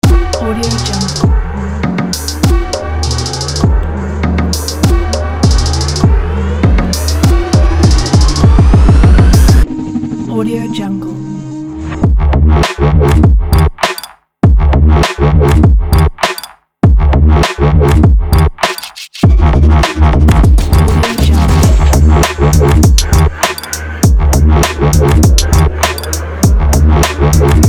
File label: dubstep hip hop